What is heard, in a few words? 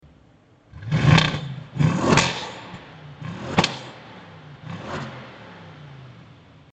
Audi Rs7